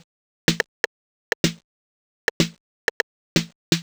drum loop before percussion 125, Classic Chicago House; Sample Magic; Drum Loops